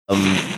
snickering

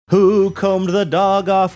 singing who combed the dog off

